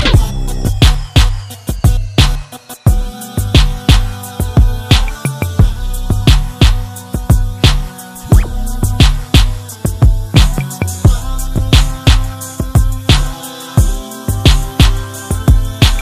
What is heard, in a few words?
Instrumental